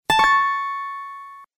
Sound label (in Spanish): letra acertada; hangman